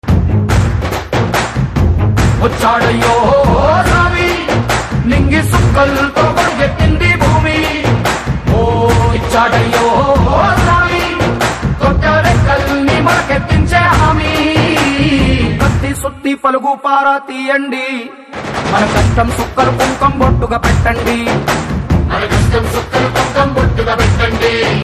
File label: Telugu Ringtone